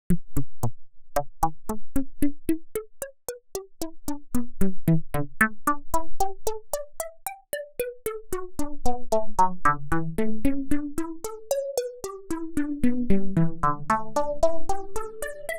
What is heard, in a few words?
trance plucks DRY, 113BPM